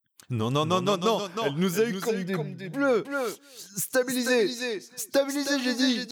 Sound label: Delay